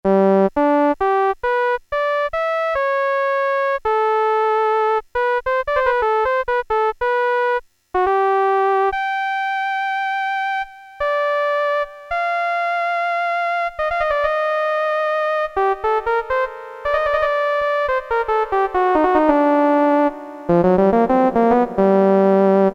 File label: trumpet, Casio MT-68 preset sounds